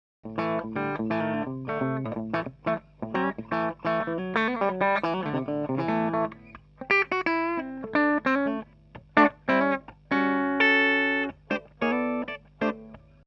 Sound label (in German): HB steg clean